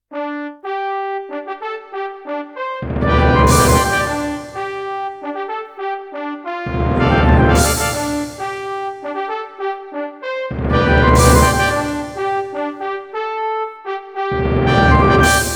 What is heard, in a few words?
Victory; Ultima Online